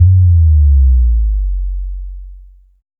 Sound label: Bass Blower, 808; Street Anthems Sound Kit